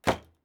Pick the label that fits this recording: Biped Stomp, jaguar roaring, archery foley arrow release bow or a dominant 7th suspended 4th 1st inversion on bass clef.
archery foley arrow release bow